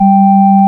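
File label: ORG Vox G, Continental organ; Continental2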